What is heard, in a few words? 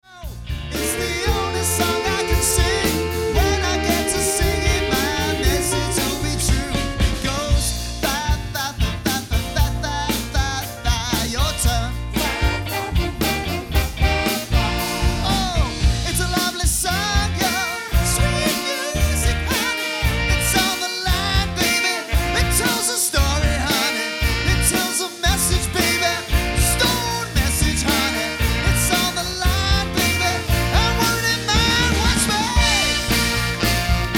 Sad Song